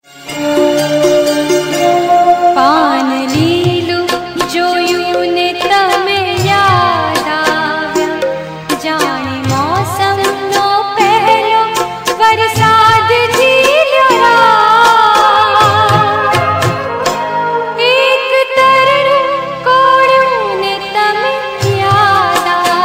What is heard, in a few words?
gujarati ringtone